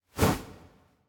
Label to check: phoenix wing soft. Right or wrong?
Right